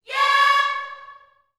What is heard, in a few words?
"yeah", gospel vocal, C#5; Spectrasonics; Vocal Planet Vol.1 Gospel; akai